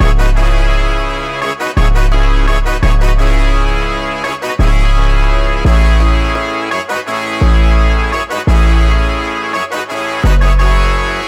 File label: 170 BPM C# Min, Melody Loops; Anthem; Cymatics